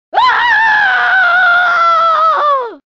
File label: impaled scream; deception; jade